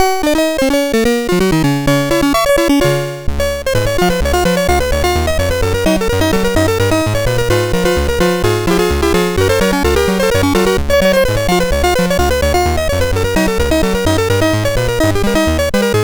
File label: Ragtime, MOS6581R3